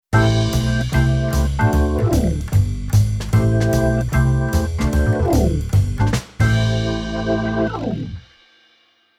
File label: keyboard organ jazz